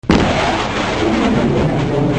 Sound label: F-100 Sonic Boom; Machines